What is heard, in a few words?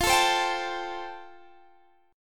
F Major Add 9th, Guitar, Standard; Fadd9 strummed; JGuitar